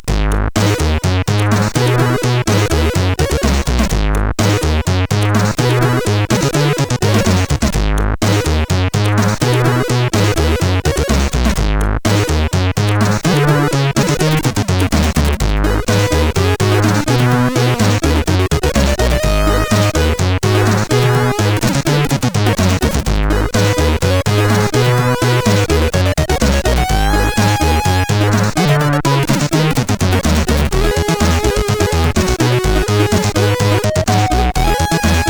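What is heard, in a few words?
Funky bass, Commodore, CSG8580; sid; CSG8580R5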